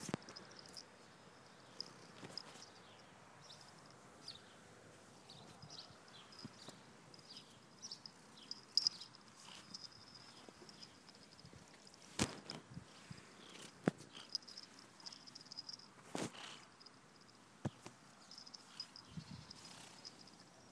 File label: meow meow purr quietly